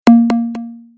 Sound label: Chimes